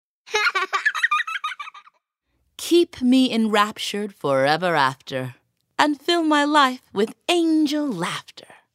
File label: Angel Laughter